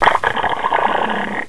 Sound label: weird; lmctf